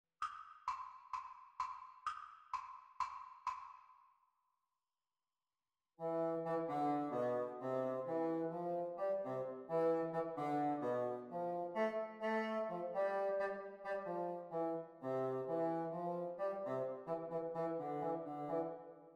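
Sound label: Bassoon Duets; Exam Speed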